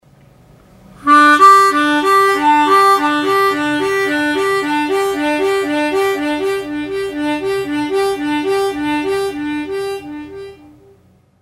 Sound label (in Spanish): trino lento; armonica